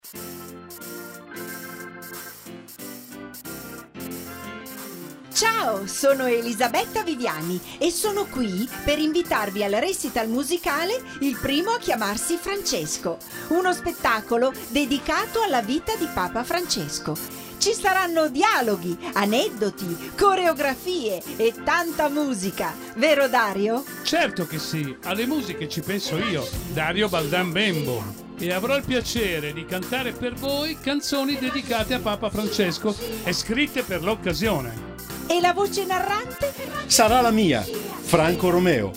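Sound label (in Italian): jingle recital radio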